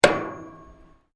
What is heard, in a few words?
METAL STRIKE; Materials